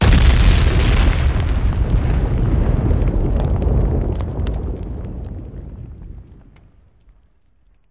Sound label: explosion; Crash Bang Boom